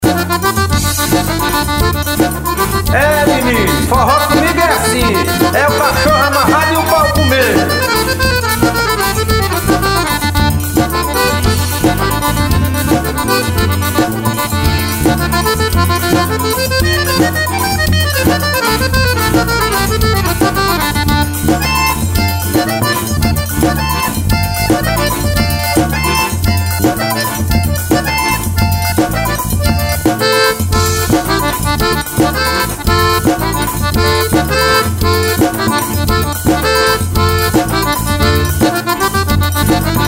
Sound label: instrumental